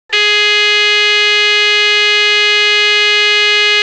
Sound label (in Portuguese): med pitch, sinos; cidade